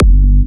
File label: Kick